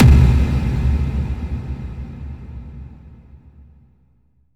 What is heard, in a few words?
Impacts